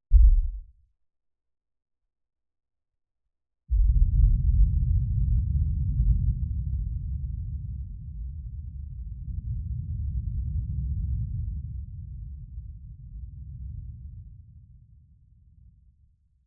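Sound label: 5.1 explosion, subwoofer channel; Sony Pictures Sound Effects Series; Explosions - 5.1 Surround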